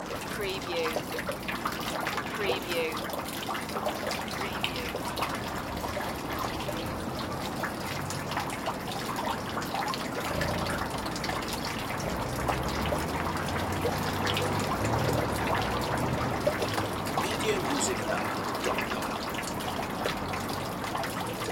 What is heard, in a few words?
Rain Well